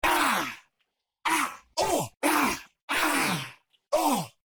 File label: Fen Elves Hit Reaction